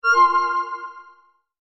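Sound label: Guit Intro; tower warning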